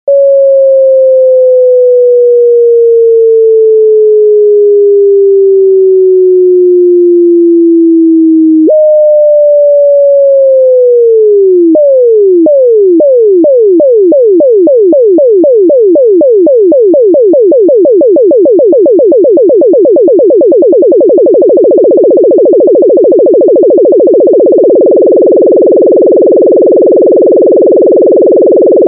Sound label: casio xw-p1 sounds, lfo on pitch; Casio XW-P1 HEX-Layer Synthesizer